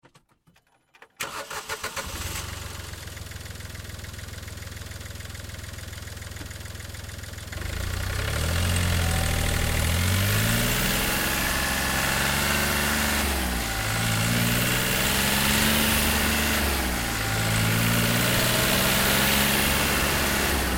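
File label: Car Smart Car Onboard Drive Fast; 2005 Smartcar Fortwo; Vehicles; Big Room Sound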